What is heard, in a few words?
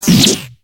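Attack Sword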